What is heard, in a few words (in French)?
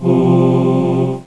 Chorale